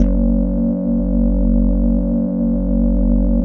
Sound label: SYN GLASY; Sound & Vision - Gigapack I CD 2